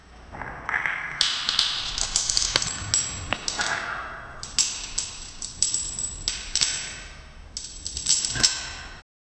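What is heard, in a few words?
enter a creaky dungeon room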